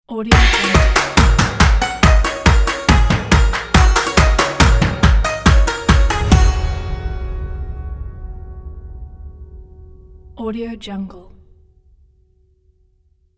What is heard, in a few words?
chinese logo; sfxvalley